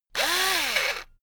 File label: electric drill screw in medium; soundpool